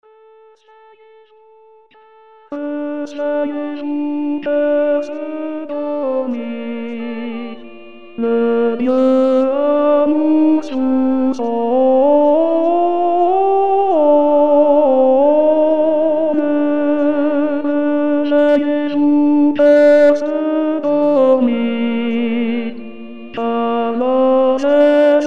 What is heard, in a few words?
tenor